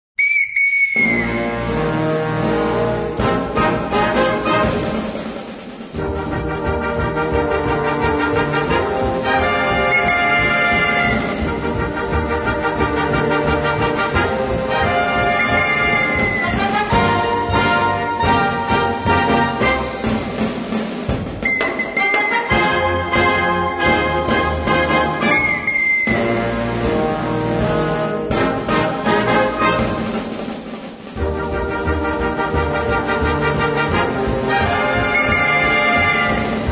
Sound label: Rock Train Song